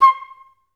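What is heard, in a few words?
flute staccato C4; Best Service; Peter Siedlaczek's Advanced Orchestra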